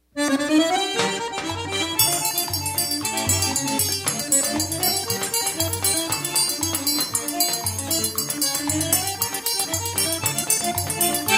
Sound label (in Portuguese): Choro Improvisado